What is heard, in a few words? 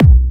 Bassdrums UK Trance Kicks; Vengeance Samples